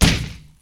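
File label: sticks, Misc Percussion Ticks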